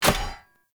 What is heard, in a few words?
Polearm Medium Impact Default, Weapon Impacts; Player; Environment; Sphere Polearm